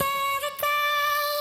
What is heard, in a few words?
Vocal Sample Pack Phrases D; Slate Digital Omnivox Vocal Sample Pack